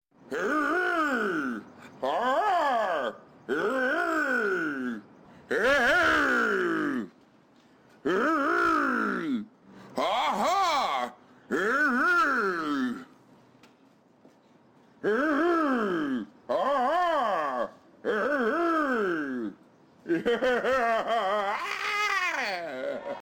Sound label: HEE HEE HEE